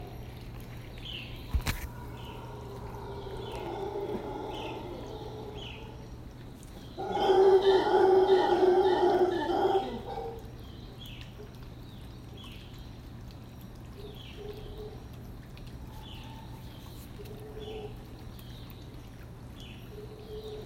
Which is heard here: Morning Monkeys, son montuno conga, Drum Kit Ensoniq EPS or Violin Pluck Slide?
Morning Monkeys